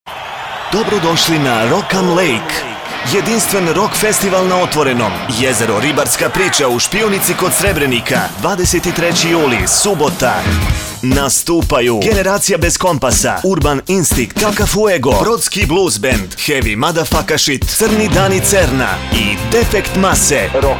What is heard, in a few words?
ROCK JINGLE; ROCK AM LAKE